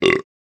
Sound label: burp